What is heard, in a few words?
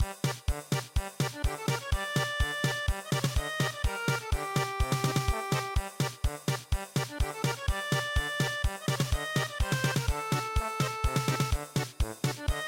German Polka